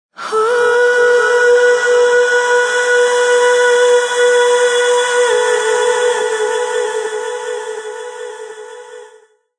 Singing F, Female, Melisma, singing, w. FX, vocalization, song, (human voice); Talking